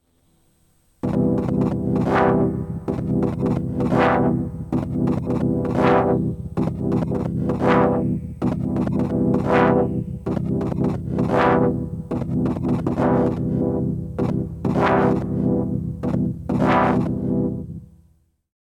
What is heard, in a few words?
Rossum EM Control Forge Juno6 Filter Beat